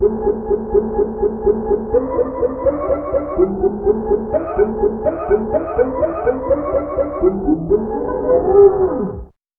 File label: fret noise bass